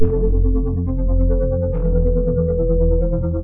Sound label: Synth Poly